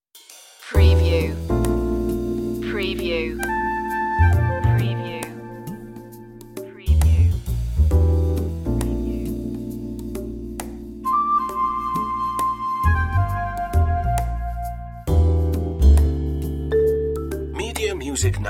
life style tv light comedy

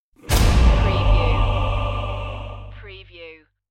the dark path horror stab

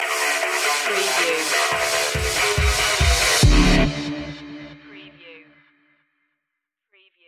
smash and grab stinger

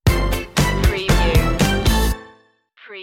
boogie disco stinger

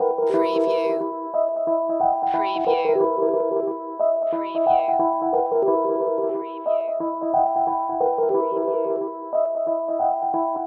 Hip Hop Dark Rhodes Licks 90 BPM Swing - E

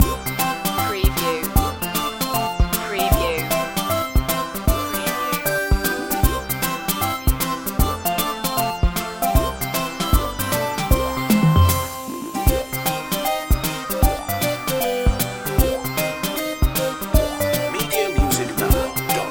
retro video game pipe world

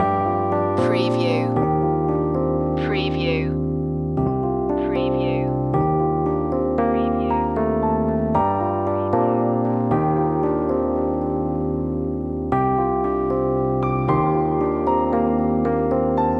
distance arriving loop

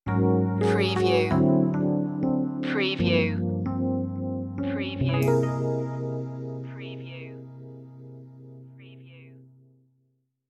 chilled dreams stinger

